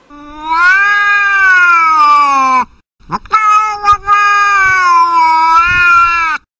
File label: cat